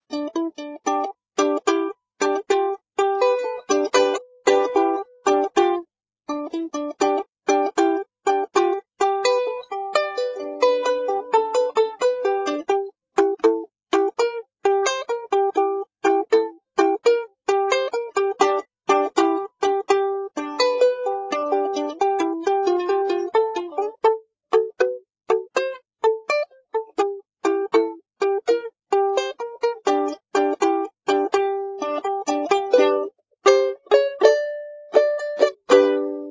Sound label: Blues